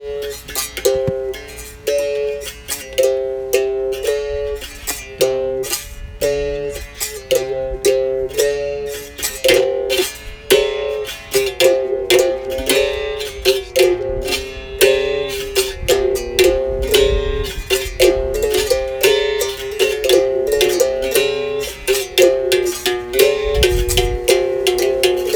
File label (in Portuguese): slow sao bento grande de regional